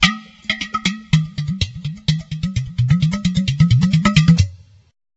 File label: udu